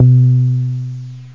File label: Woody Jungle Bass C2; Bass Hits